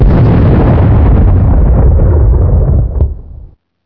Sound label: explosions; ambient; source engine; hl2; video games